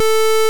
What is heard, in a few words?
blep; JaySynth; osc; blip